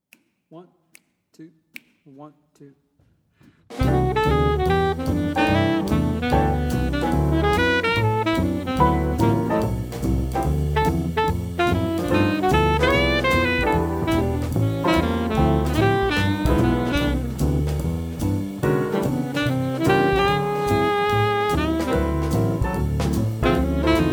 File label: Alto Sax